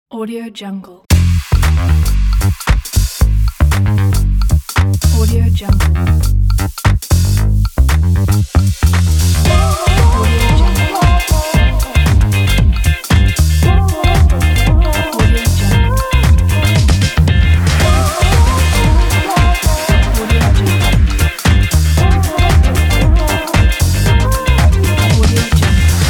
funky house intro opener logo

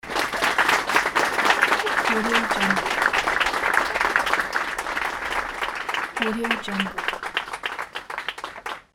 outdoor applause

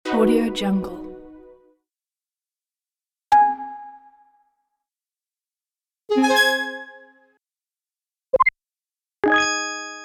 menu buttons sfx; carcasound

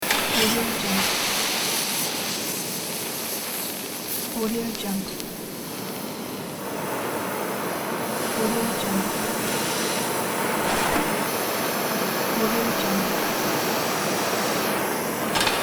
blacksmith burning metals loop; thesoundworkshop

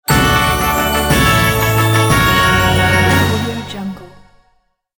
winning

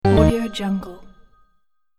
horror notification drop

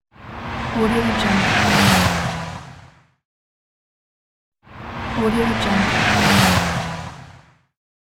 motorcycle passing by quickly

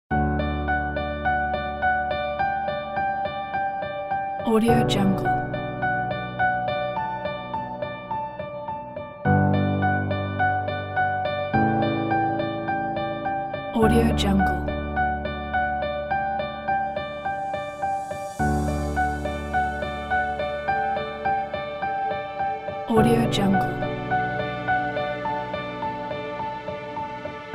inspiring motivational epic

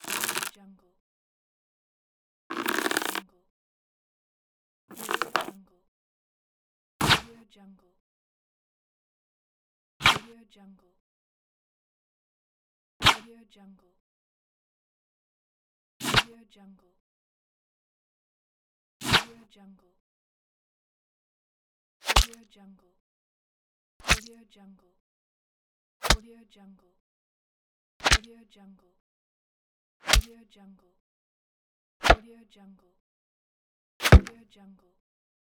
bow and arrow sfx pack; stormwaveaudio

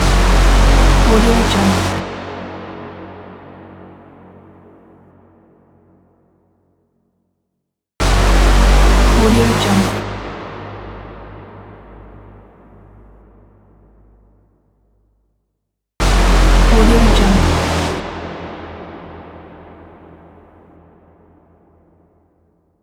cinematic trailer mega horn

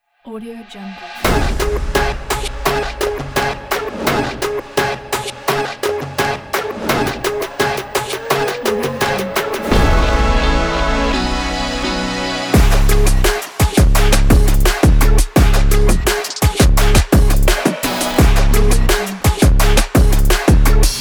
epic high energy sports trap; urbanriviera

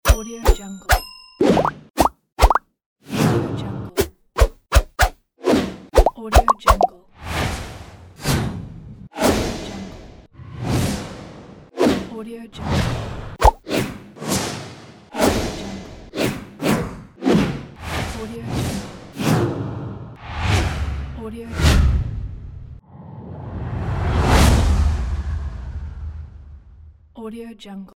swooshes; medusamusiclab

